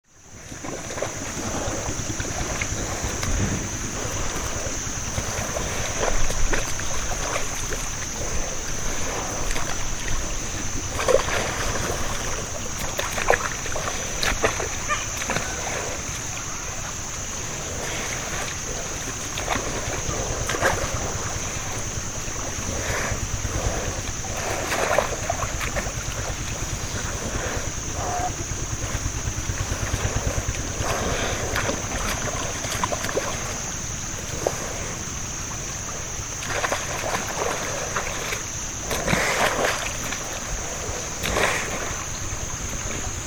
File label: Water Buffalo, vietnam